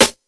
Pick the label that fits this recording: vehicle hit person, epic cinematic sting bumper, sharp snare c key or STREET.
sharp snare c key